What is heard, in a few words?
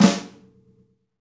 Rock Snare Fl H, Roland BK-5; Snares